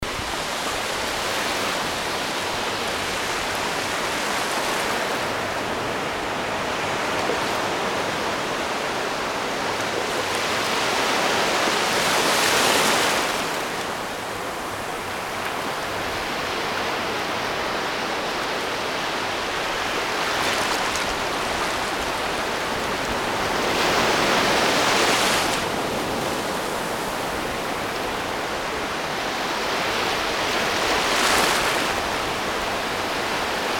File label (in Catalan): Ambient espectacular a l'aigua pujada de la marea